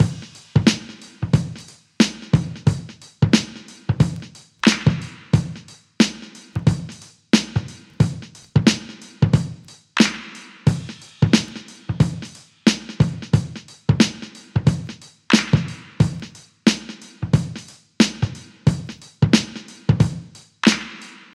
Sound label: 90 bpm drum loop sample e key, Free drum loop - kick tuned to the E note. Loudest frequency: 1133Hz